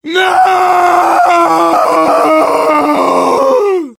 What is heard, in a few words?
long scream